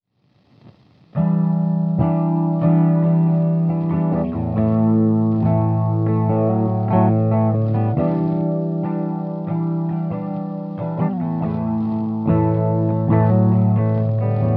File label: RADIO, lo-fi